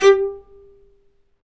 Vc Marcato; String Master Series; Combos 1

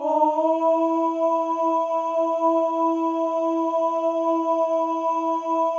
male ohs; Spectrasonics; Symphony of Voices

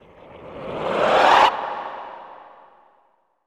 CHO FALL, CHO Falls; Sound & Vision - Gigapack I CD 2 (Roland)

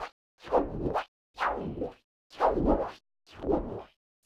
RUSH; Spectrasonics; Liquid Grooves 1 & 2; ODDS + ENDS

